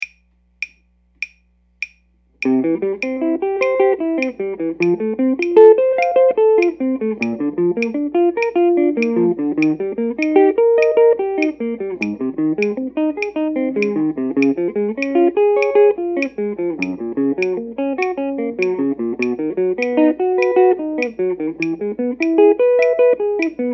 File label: major triads 100